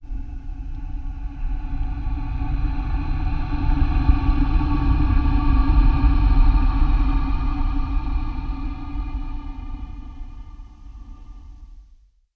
Ghost Breath

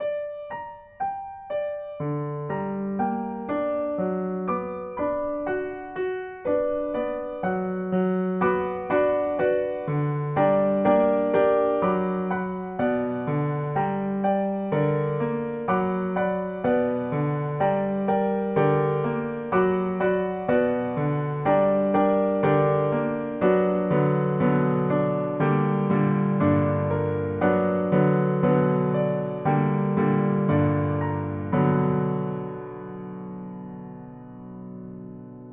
Silent Movie

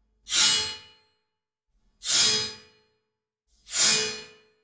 Metallic Zing

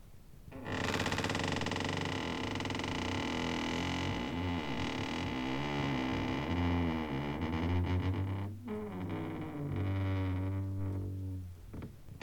Creaking

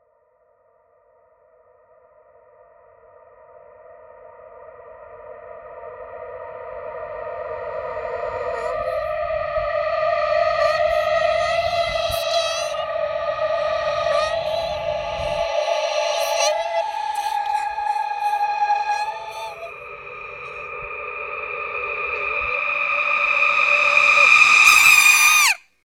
Reverse Reverb

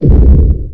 Explode

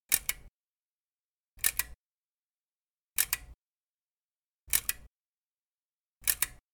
Vintage Kodak Camera Shutter

